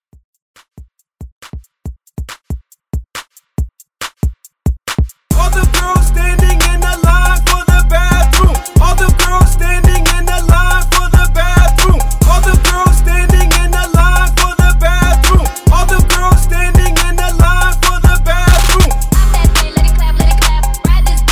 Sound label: Hype